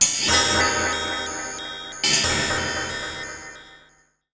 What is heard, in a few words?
Ring Mod & Delay, contact mic Cymbal; musicradar; contact mic madness